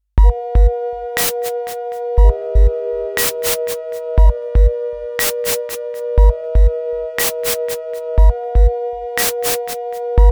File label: Sinewave